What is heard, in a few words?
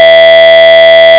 square; sines